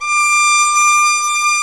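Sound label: violin tenuto D 5; AKAI S-Series CD-ROM Sound Library VOL-7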